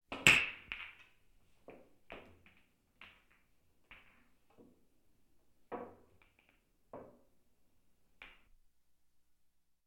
Sport Billard Queues Kugeln

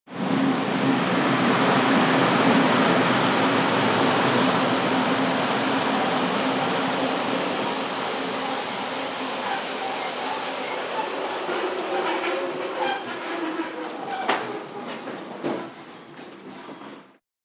zug bremst

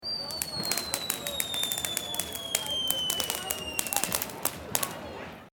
Silvester Pfeifer

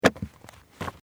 office ordner

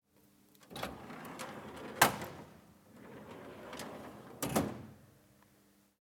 Schublade

